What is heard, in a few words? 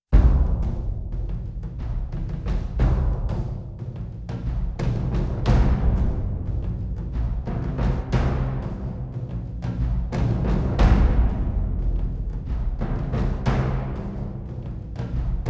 Drums; трейлерная музыка